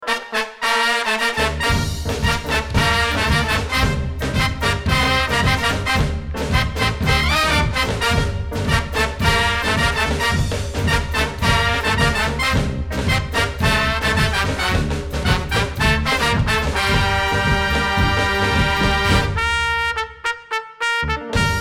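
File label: full play trumpet reference y bandoneon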